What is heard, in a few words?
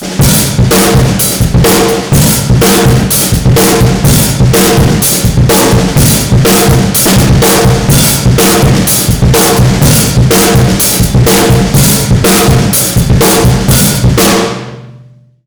bass boost, drum beat ringtones; iphone